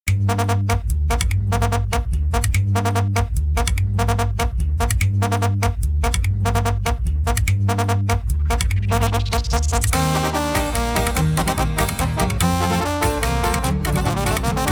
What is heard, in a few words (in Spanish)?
CORRIDOS PROGRESIVOS